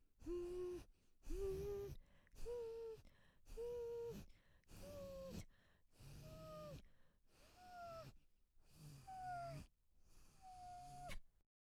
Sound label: humming